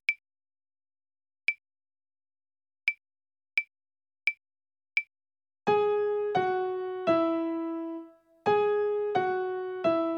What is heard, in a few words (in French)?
Violon